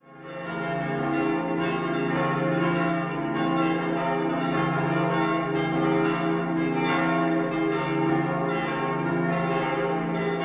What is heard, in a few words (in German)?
Stadtkirche Glocken